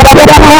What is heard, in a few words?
FX GTR, GUITARS KIT